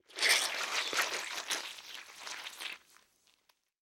Sponge Squish, Household

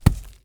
Impact Dirt Hit; Impacts

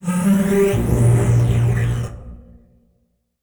Transition Distortion Power Source; Production Element Title Transition

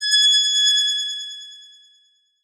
Transition Feedback Ring

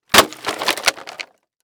Impact Wood Crash Multiple; Impacts

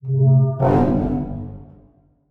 Transition Bell Swell; Adobe; Production Element

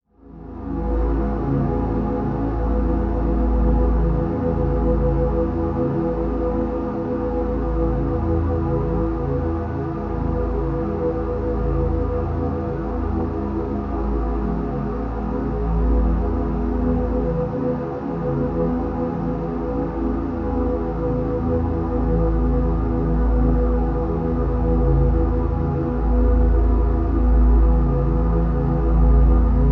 Drone Phase Deep Slow; Production Element Imaging; AdobeCC

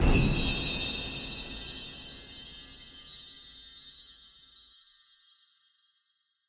Christmas Chime Explosion; PremiumBeat

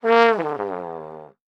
Cartoon Trombone Deep Note

